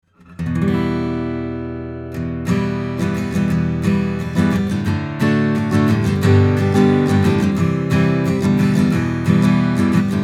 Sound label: U67 Cardioid Ac Git Chords; Triton Pre